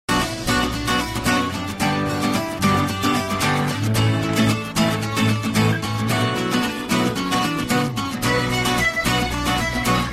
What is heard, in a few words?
Melodic Guitar